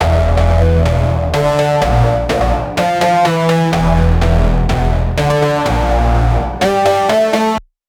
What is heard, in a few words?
Dark Techno Synth Loop 100bpm